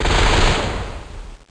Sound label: struct hit; Stealth Combat